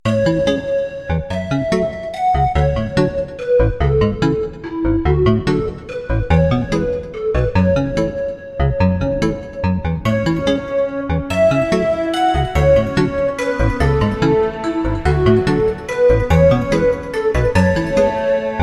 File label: Grotto